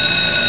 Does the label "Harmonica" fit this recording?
No